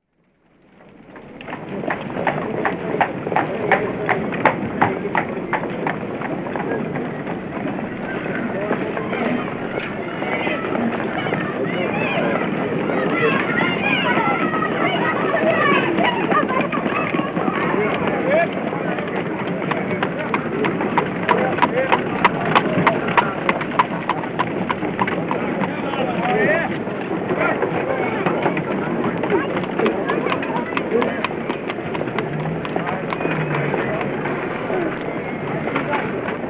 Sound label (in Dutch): Londen begin 20ste eeuw